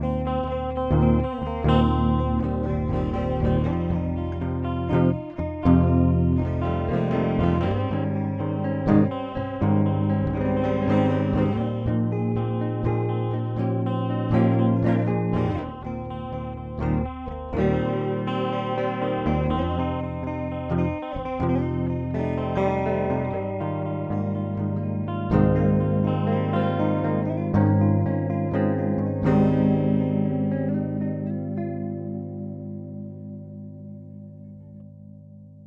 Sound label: Wampler Terraform Stereo Dimension